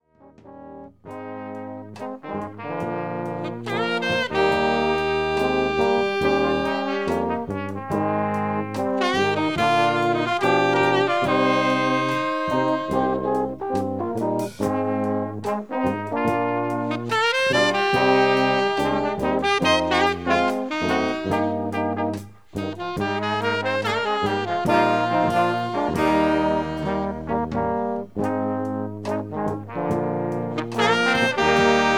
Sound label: Country